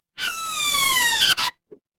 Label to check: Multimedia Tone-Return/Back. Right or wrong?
Wrong